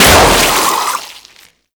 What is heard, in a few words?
Balloon Pop; Festivities; Warframe; Follie